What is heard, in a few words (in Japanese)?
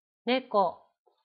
猫